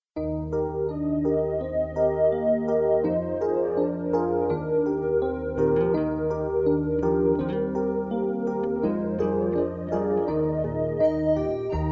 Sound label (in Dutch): roodkapje karaoke